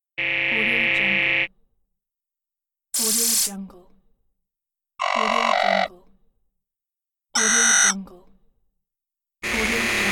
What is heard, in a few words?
buzzes